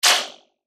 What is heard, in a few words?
Beep Tone Signal Multimedia; Web Interactive